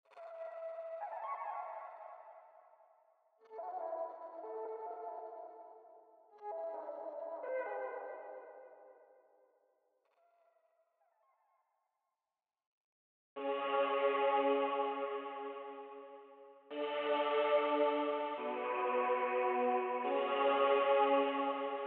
Dark Loop 144 BPM F# Min Accents; Stems; Paranoia

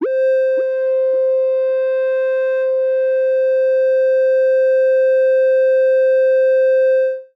LEAD (C); Dean; Cymatics; Orchid Sample Pack

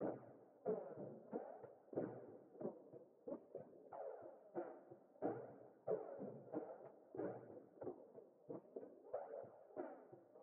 Drum Loop 92 BPM Percussion; Loop Stems; Livid Drum Loop; Cymatics; Vibes II Drum Loop Collection

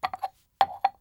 Ceramic Cup PU Down; Fairlight Sound Lib

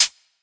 Close Hi Hat, dubstep; Drum Shots; GhostHack; Demolition; Venomous

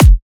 Kick, Drums

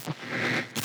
perc oneshot; GhostHack

